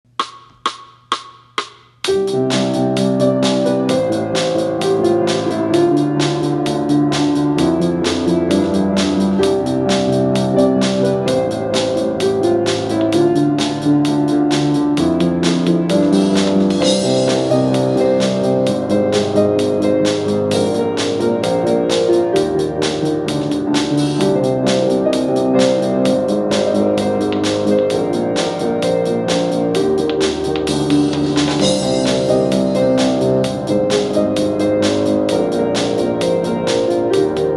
With Drums Home Recorded

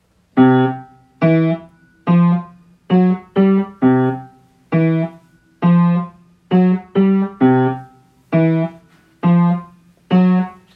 Dinosaur Stomp, Home Recorded

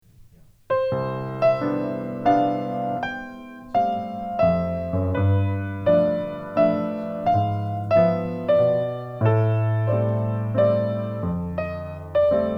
Cool Breeze Waltz; Faber

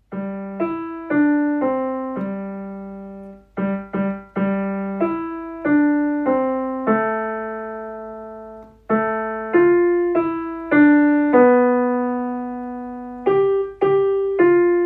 Jingle Bells, Home Recorded, student recordings

